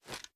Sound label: reload fast rotate; gmod